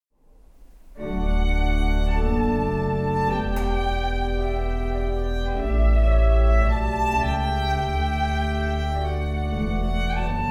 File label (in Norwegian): hymne, instrumental